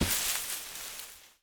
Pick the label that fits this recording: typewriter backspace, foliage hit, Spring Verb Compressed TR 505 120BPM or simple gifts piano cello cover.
foliage hit